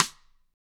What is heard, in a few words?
GRCH STK, GRETSCH SNR; ILIO; Double Platinum Drums 1